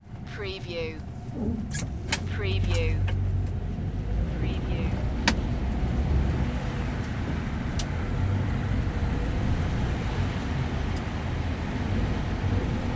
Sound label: taxi interior rain accelerate stop Kyoto Japan